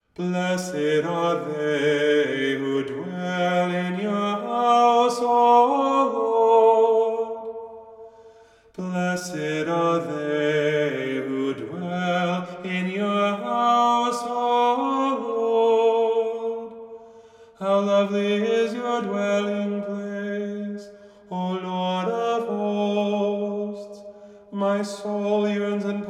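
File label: acapella; Chabanel Psalm Tome; Holy Family optional readings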